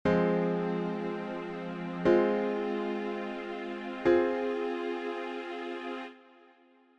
Fm All Inversions Root

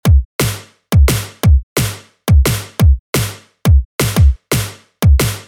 DNB KICK SNARE

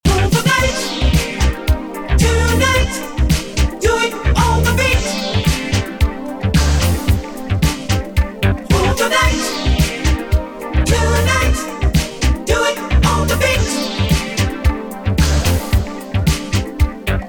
Sidechain New Kick ORIGINAL

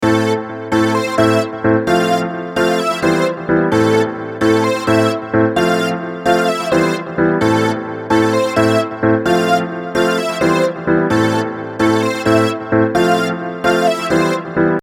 2 bar Melody Harmony A minor

